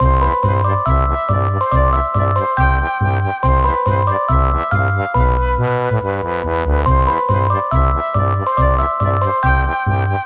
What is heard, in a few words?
Complete